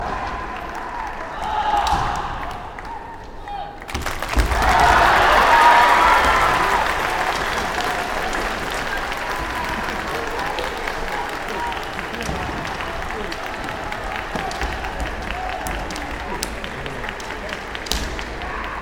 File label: Kendo spectator